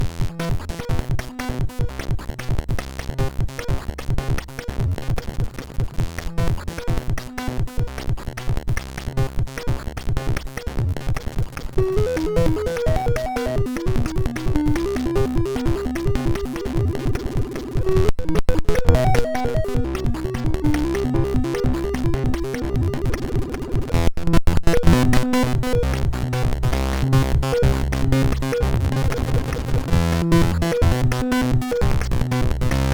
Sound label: Ode to Metal, MOS6581R2